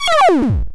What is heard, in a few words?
falling; movement